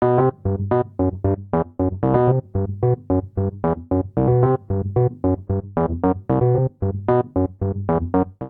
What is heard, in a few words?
Ostinato Bass Descending Diads C Lydian 113 bpm, Electric Keyboards; MIDI Clips; Ableton; Factory Packs